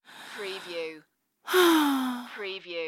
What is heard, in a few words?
Breath Inhale Exhale; sound-effects-bible